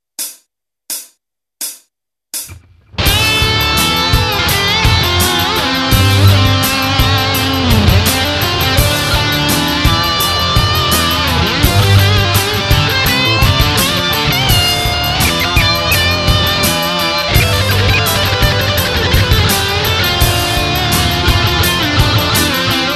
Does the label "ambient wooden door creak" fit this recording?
No